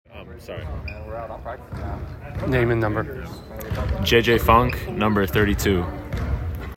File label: funk